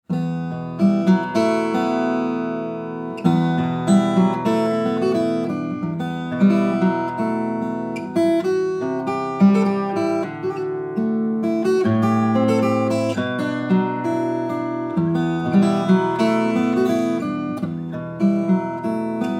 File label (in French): guitare Eastman E80M trad breton